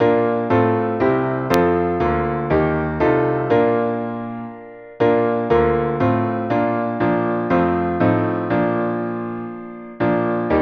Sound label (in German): Klavier